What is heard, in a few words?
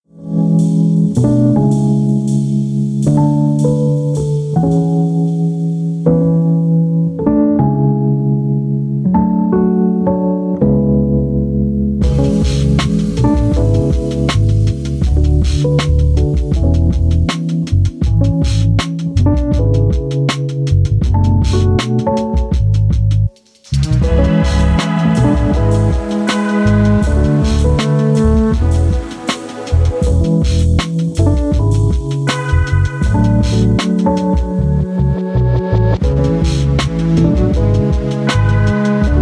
lofi beats